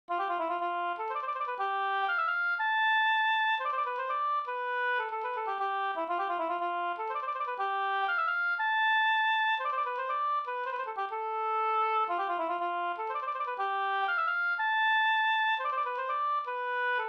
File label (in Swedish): polska Bodapolska